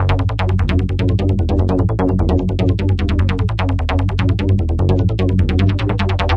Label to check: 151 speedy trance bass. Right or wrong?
Right